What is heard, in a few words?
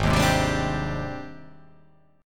G sharp Major Sharp 11th; 4,6,6,5,7,7,4,4